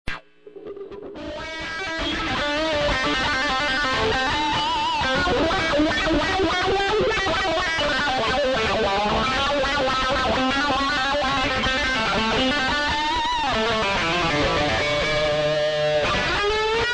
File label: guitar wah wah